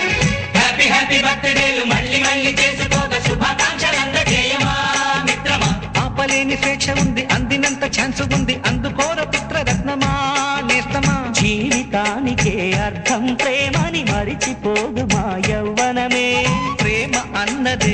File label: happy birthday song